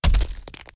step, krampus

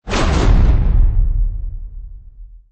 big hit, robot; mob

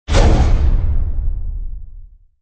walk, robot; mob

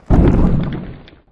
stun; mob; orgeleader

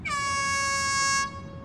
begin, arena

